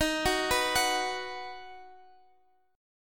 Eb Minor Sharp 5th Guitar Standard; Ebm#5 arpeggiated; JGuitar

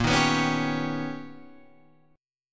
B Augmented Major 9th A sharp, chord

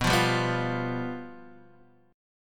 F Suspended 2nd Flat 5th B

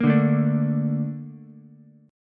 A sharp Major 7th, Ukulele; strummed; JGuitar

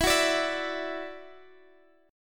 E 7th Suspended 2nd, down; E7sus2; strummed

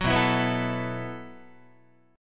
B 7th Suspended 4th B down Guitar

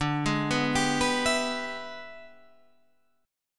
E 7th Sharp 9th D, chord

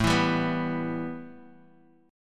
B 7th Suspended 4th Sharp 5th A

